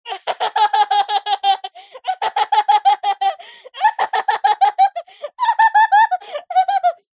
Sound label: human child boy 9 years old laugh hysterical; zapsplat